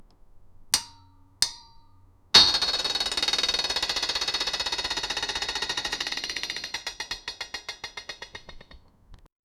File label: steel bar slowly bending; deformation